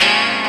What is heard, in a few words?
CHORD, Drum & Bass, 130 BPM; Ueberschall; Drum & Bass Flash Trackz